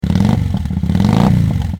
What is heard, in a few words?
engine start, moto